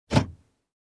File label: Locking up car